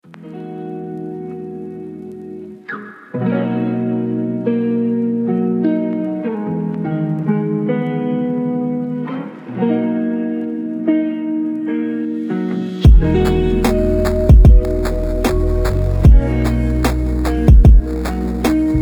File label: Lofi Chill, Chillhop Lo-Fi Muzyka; Chillhop Lo-Fi Muzyka Dlia Ucheby I Raboty